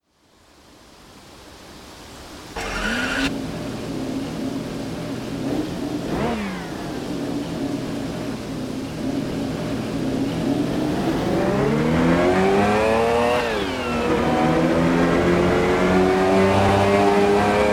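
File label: pagani zonda c12s, car; TDU-1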